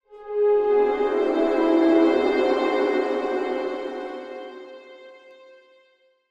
Stinger Light; Angry Birds Star Wars 2; Crimson

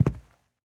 Footsteps Sneaker Carpet Walk

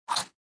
Chomp Sound; sushi cat

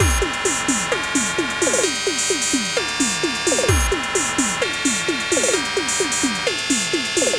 Electro

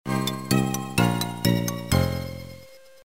Death; Chicken Little

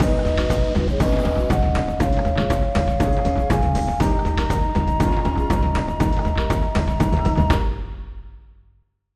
Win Level; Zuma's Revenge

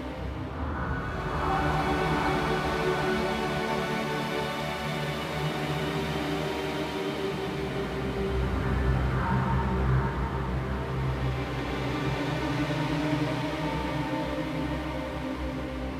Danger Tonal; Insanely Twisted Shadow Planet

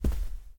Step Carpet

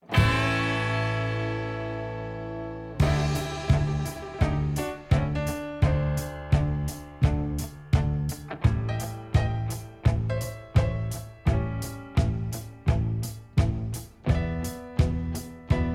Karaoke Country; The Sims 4 City Living; macOS; PS4; Windows; Xbox One; Xbox Series X/S

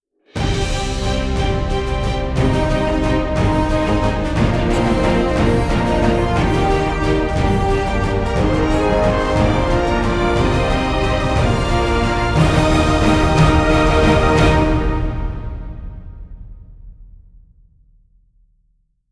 War Victory; Guns of Icarus Alliance

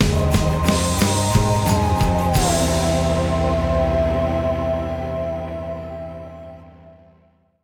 Victory Stinger

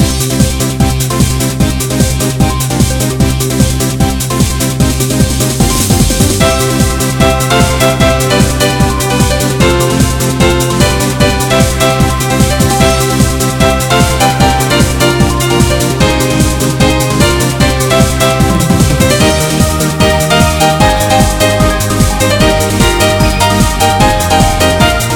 Shooting Loop

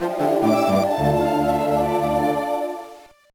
Failed, gba; Level 4

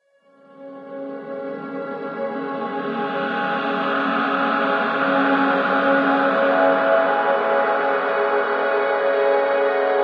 Village Sting; ether one redux gamerip 2015

